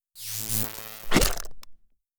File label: JELLY SQUISH; sloodle